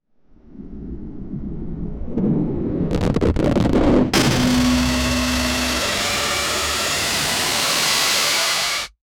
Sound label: vrrrrrrrmmmmm intense; build up